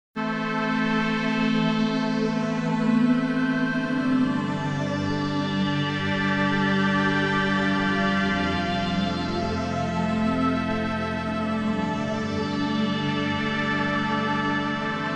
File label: VSM EMINENT 310 upper and lower; gforce software